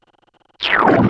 squish

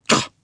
ehgulp

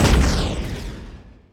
explosion; Phoenix

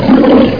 Big Cat Growl; Club Amiga de Montreal - CAM; Amiga 8-bit

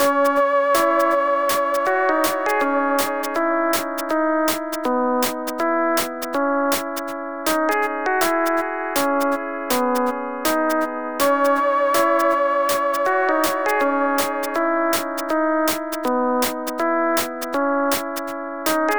music; delirium

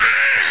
AHH; os2

